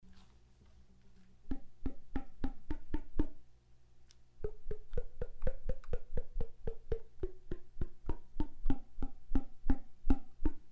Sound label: Clar Palm Tongue Ram